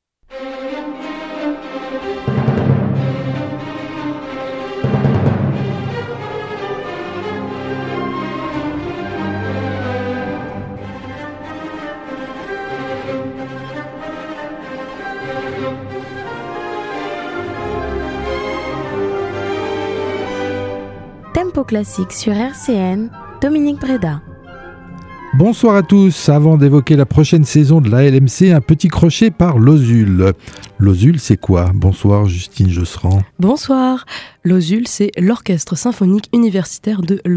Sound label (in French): orchestre syphonique; tempo classique; universite de lorraine